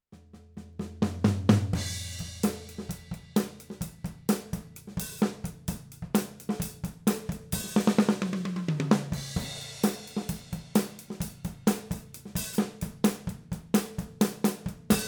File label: drums